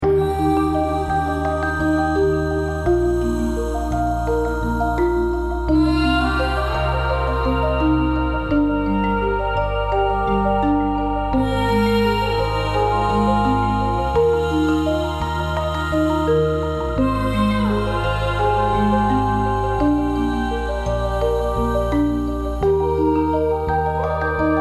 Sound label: Healing Melody